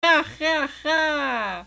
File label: hahaha